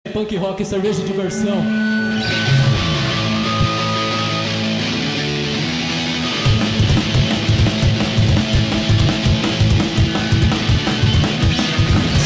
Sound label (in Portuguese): punk rock ao vivo; cerveja diversao